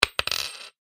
money coin drop, Coin Drops Onto Table; Coin ( Single ) Drops Onto Wooden Table; foley; Objects; McKinney Sound

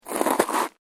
SNOW SCRAPE; Material components for constructing more complex sounds; Sound Effects:Weather

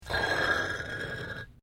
PAVING STONE MOVE, dragging

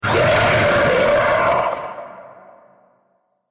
Scream Huge Monster, Monster Sound Effects; horror

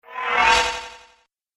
Old Metal Swish, whoosh

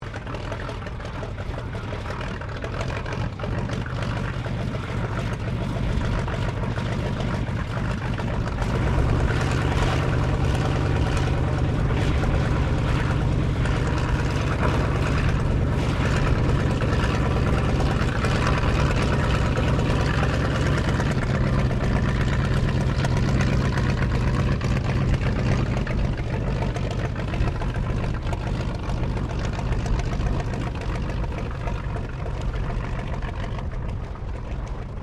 AIRCRAFT SOPWITH PUP REPLICA IDLE TAXI; Airplanes; Vehicles; 1916; Replica of a 1916 Sopwith Pup

